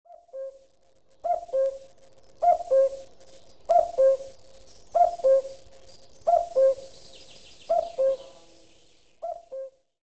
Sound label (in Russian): kukushka zvuk kukushki na telefone